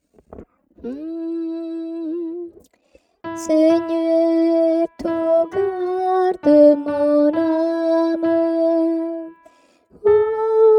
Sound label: seigneur, soprano